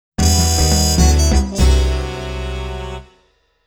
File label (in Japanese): 各話終了ジングル; Black Jack Hi no Tori Hen